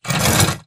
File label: Stalker Damaged Crawlscrape Concrete; Titanfall 2